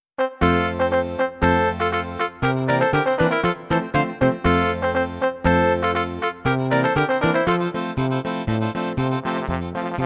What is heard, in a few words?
Polka